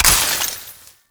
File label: Ammo Pickup Toxin; Ammo Ability; Warframe; Warframes; Frumentarius